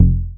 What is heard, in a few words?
Deep Bass